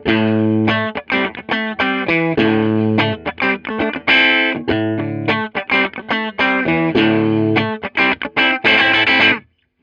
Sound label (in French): tele chevalet clair, fender road worn